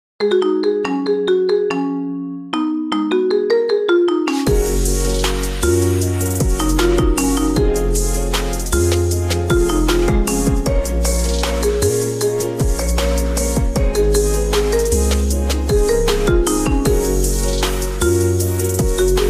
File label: thirsty marimba remix